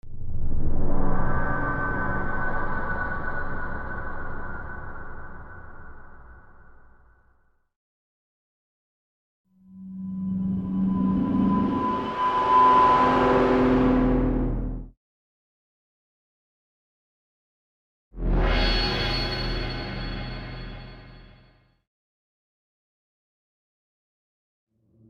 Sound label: dark darkness